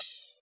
Hat O, Hi Hat; Zero-G Club Techno; Percussion

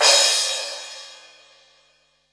crash L; Zero-G; Chemical Beats; CRASH ZOO

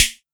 Quasimidi 309, Drums

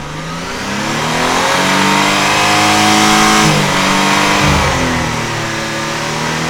rev loop, Engines; E-MU Producer Series Vol. 3 – Hollywood Sound Effects; Ambient Sounds

